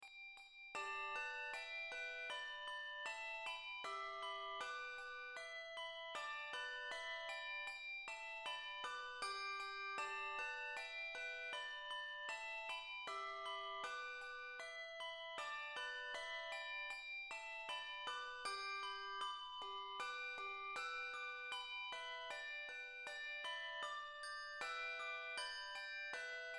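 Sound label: handbell 2 octave; Beach Spring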